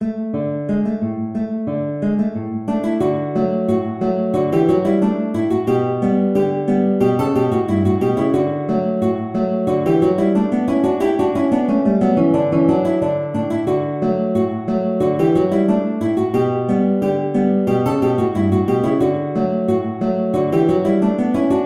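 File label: 2 guitars, guitar duet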